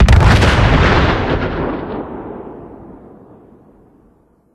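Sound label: Explosion Car Nuke Distant; War Ambience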